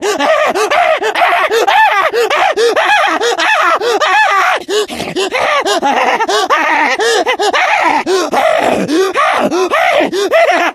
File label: jockey attack loop, voice; Left 4 Dead 2